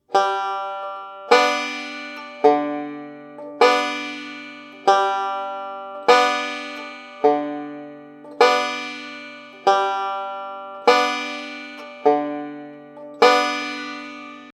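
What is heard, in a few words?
pinch pattern, banjo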